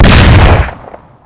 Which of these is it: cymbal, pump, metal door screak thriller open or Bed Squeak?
pump